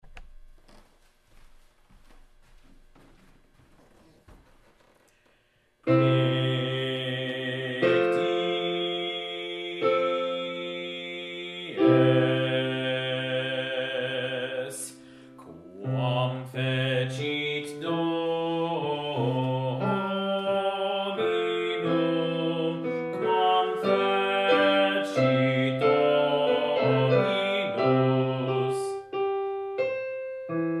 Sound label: Bass; Haec Dies, O. Ravanello